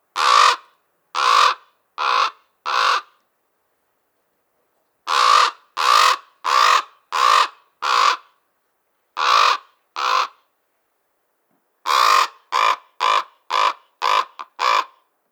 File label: Common Raven Calls; Rabenvogel